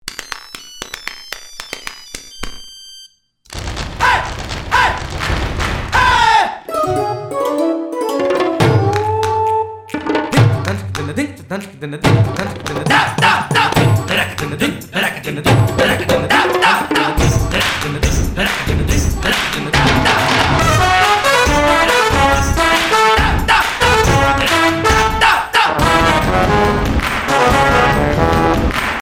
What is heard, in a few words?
nokia mix, ringtone